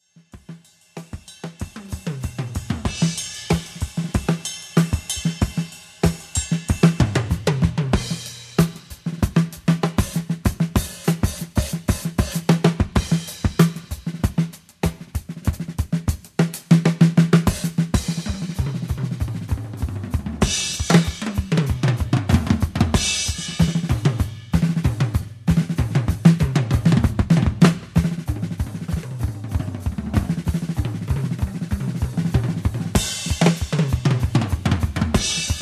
percussion spectrum percussion power

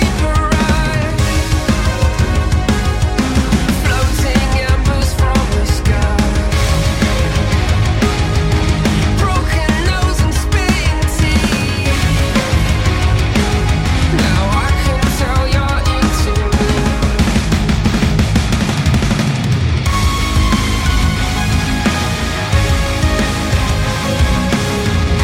death metal

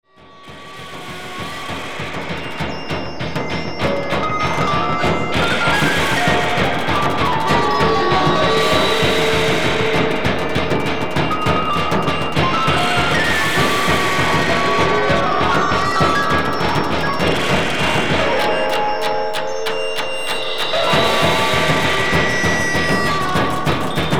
drum run

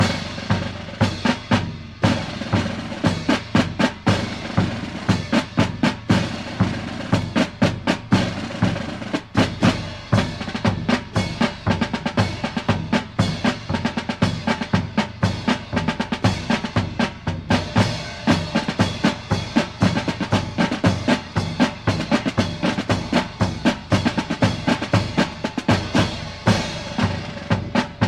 percussion spectrum drums on parade

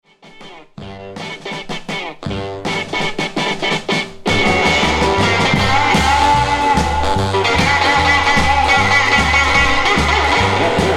space guitar

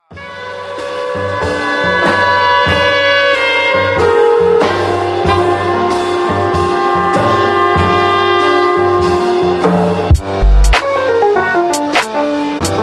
piano

